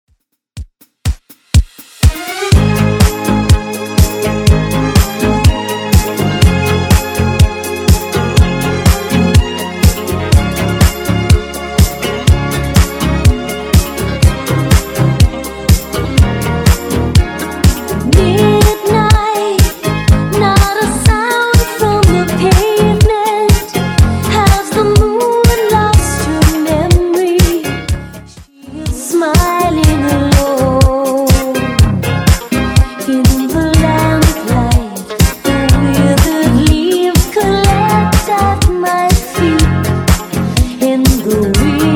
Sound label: 80s Redrum 123bpm